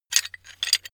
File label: metal handling pieces